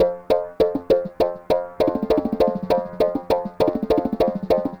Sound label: Processed Perc